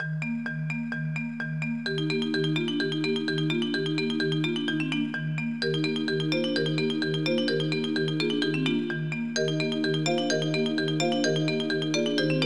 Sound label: cheerful marimba, chiptunes